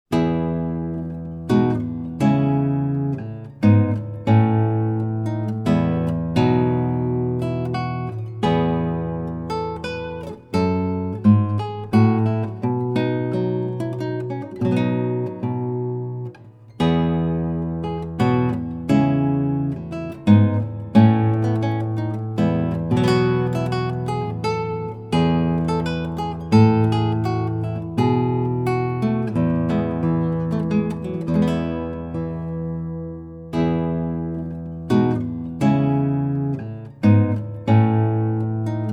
Classical Piece, Spanish Guitar Duo; Frog Galiard

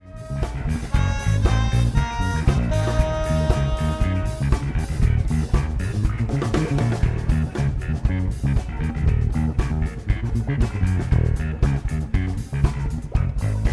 (Instrumental), Swing; Face The Music Booking

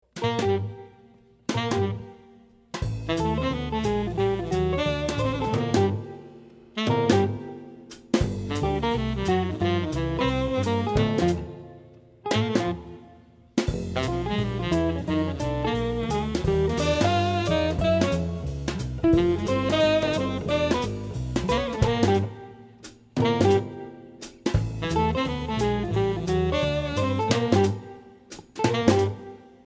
swing

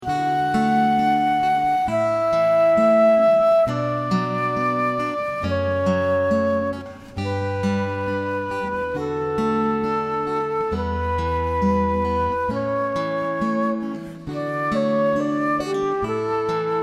variety band; Canon in D

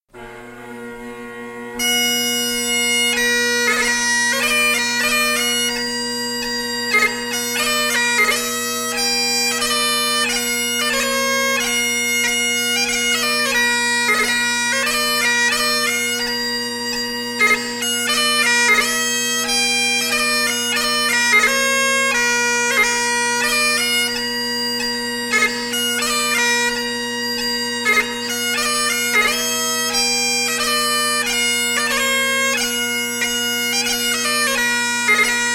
bagpipes; Scotland the Brave Set

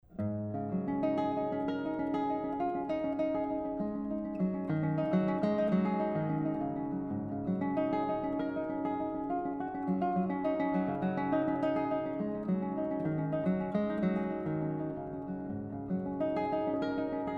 acoustic guitar

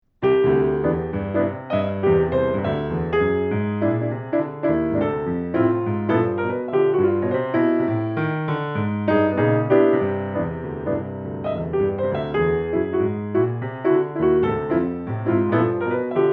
solo jazz piano

